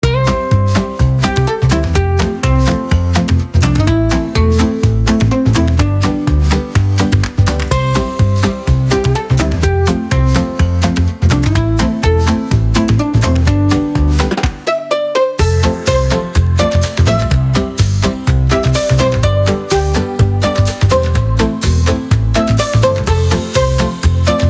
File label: 125 bpm Happy Western Guitar, instrumental; 32 sec; ai social media music